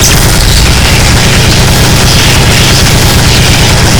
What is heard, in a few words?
minigun shoot crit; weapons; tfc; tf2classic